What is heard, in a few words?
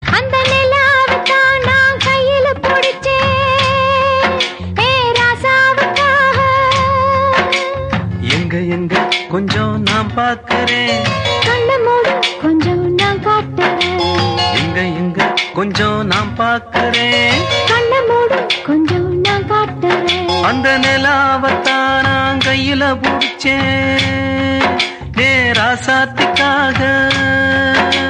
Tamil Song Ringtone; Muthal Mariyathai